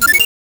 tirori; ゆめ2っき

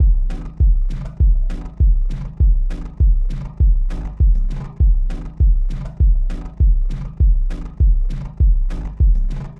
techno 100; ゆめ2っき

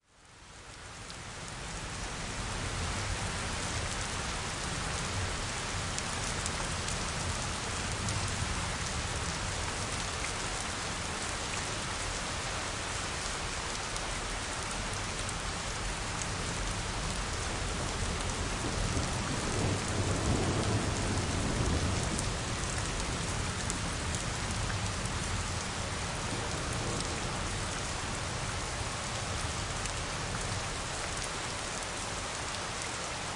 env rain

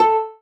marimba rainbow; ゆめ2っき

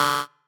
marimba bike; ゆめ2っき

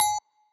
marimba; ゆめ2っき

